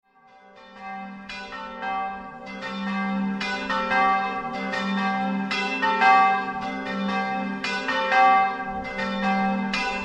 DRIOLASSA, campane

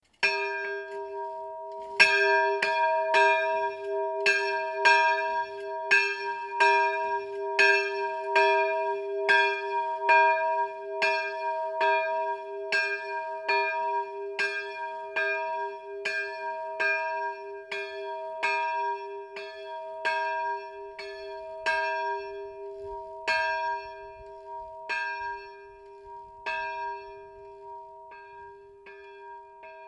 Vuezzis, campane

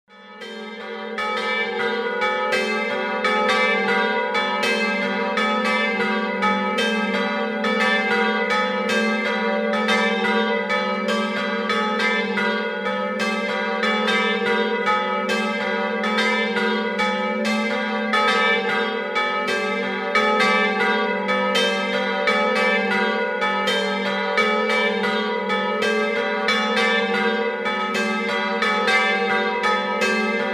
muina, campane

